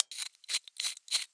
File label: silencer off, weapons; usp